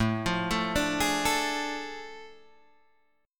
A Major 7th Suspended 4th Sharp 5th, Guitar, Open G